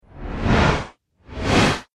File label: Whooshes 2 Speeds